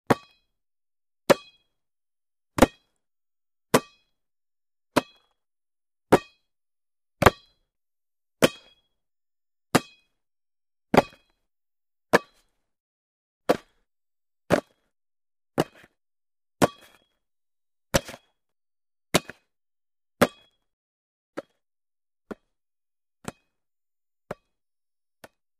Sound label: Football, the goalkeeper catches the ball